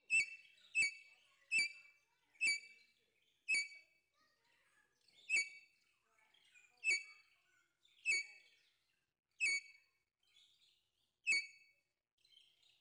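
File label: crested bustard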